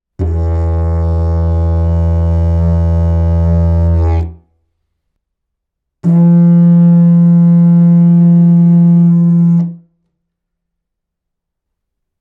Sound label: E, didgeridoo proline eukalyptus e